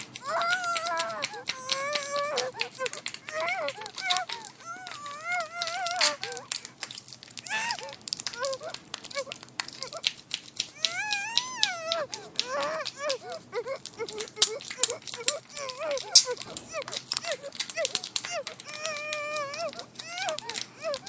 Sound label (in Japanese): 手足縛って口にガムテープ; 効果音あり